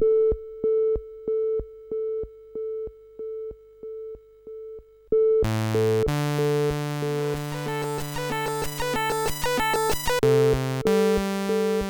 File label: Frantic Mix, CSG8580R5